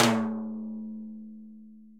Timbales C L Flam; Latin Percussion; Drum Hits; Ableton; Factory Packs

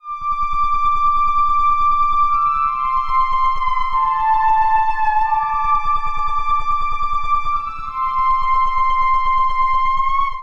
Ambient Swells Pad A Minor 92 bpm; Melodic; Tonal; MIDI Clips; Drive and Glow; Ableton; Factory Packs

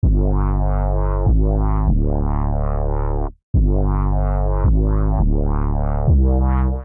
Bass Hits C Minor 140 bpm, bassline; MIDI Clips; Tonal; Skitter and Step; Ableton Factory Packs

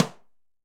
Timbales B L Mute; Latin Percussion; Drum Hits; Ableton; Factory Packs

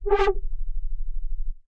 Electric Eel, Synth Lead; Ableton; Creative Extensions; Poli

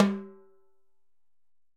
Timbales H Open, Latin Percussion; Drum Hits; Ableton; Factory Packs

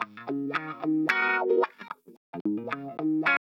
Pop Guitar Wah, Ebm, Electric; Pop Rock

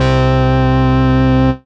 Brass, Retro Synths; Ableton; Factory Packs; Opus

